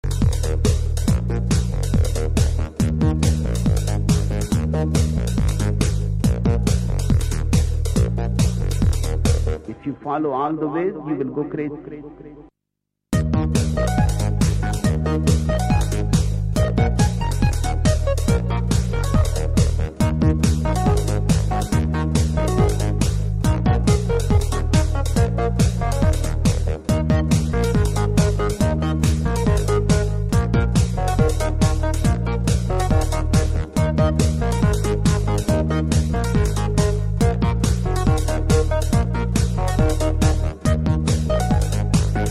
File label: Acid Folk